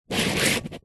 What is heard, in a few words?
sport soccer ball flat scrape concrete; zapsplat